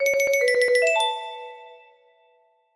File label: train jingle, music box melody; new indian national railways